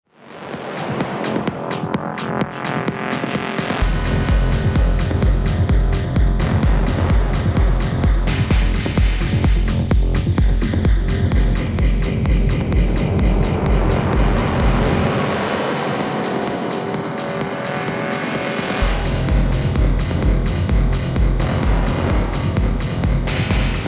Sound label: Denon DJ X1850 Hall Echo